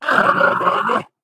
ballbot awww

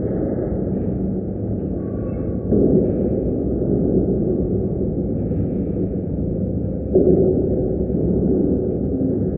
amb industrial muffled lp; video games; ambient

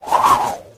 pda spin; video games; player; source engine; tf

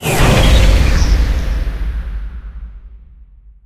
gel tubes connect; Source Engine; Portal 2

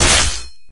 cleaver hit; tf

